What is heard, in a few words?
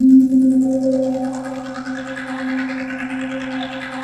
TRICKLERS; Pro-Rec - Synthsonic